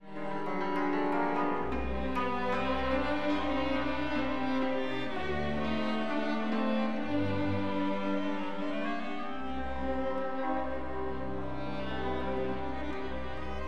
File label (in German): Czardas